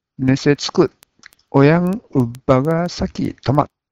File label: tuba